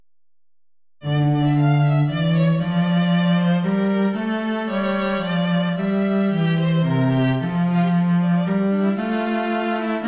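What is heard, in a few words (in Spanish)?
pequeña aria para violín violonchelo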